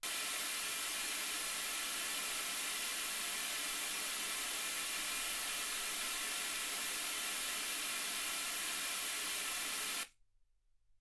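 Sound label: white gaussian noise 48kHz; single mic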